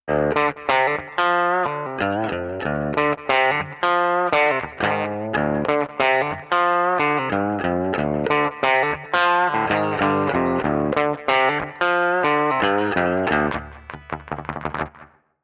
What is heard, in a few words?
funk heavy flat mellow; palmer pdi 03 jb